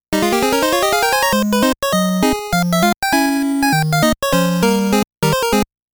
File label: Battle Win